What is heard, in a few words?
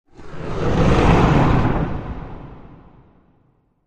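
Tiger Growl Eerie Low; tigers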